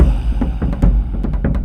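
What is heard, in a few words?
Music Shield; From Dust; ps3; xbox 360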